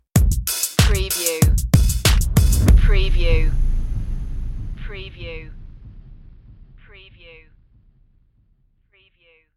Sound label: stinger; party music